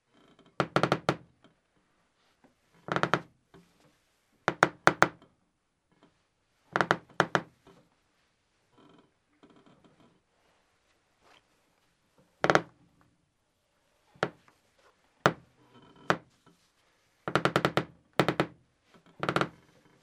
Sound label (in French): plancher qui craque